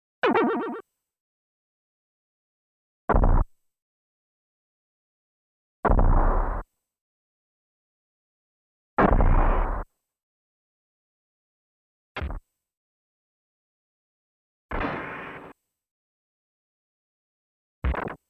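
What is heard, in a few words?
Shoutotsu-on, collision sound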